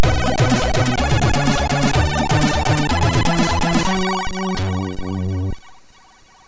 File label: sid MOS6581R2